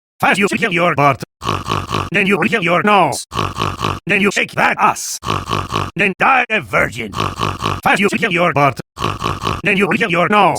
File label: SNORT SNORT SNORT Acapella